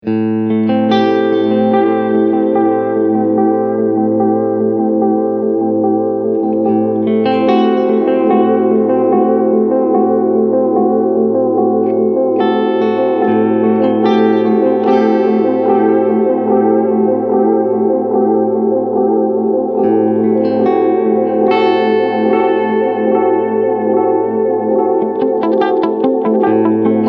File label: Long Delay